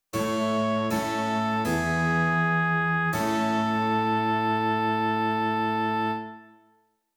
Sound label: continuo, dictation